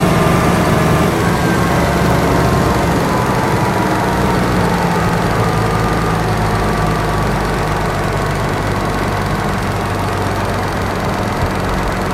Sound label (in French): Décélération